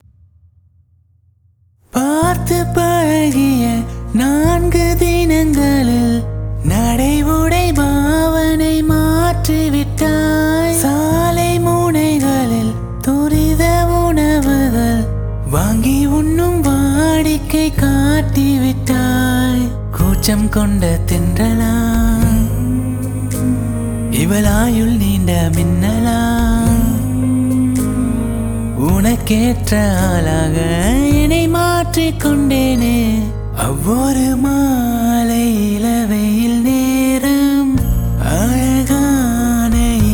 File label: Unplugged Cover